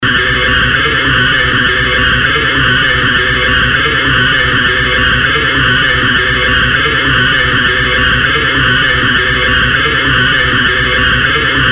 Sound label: Thrash It, guitar